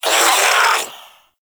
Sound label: Shadow Vocal Pain; Warframe; Duviri